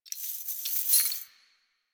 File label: Bird Three Body Shake; Warframe; Ambience; Entrati